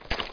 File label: run desert; specops